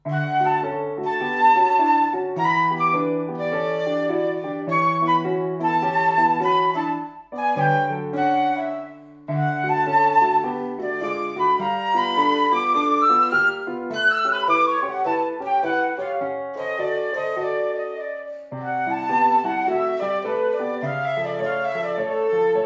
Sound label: eating flute